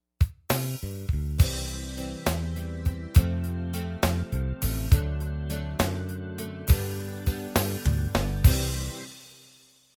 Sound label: Bass Lick with Triplets